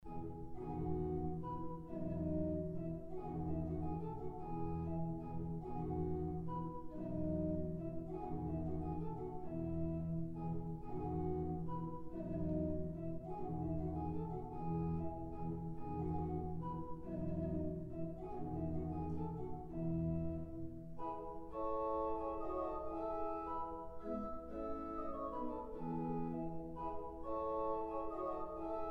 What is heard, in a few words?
Musette and Rondeau organ; Rameau